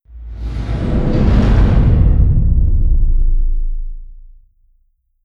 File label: distance assimilate fire layer; apocalypse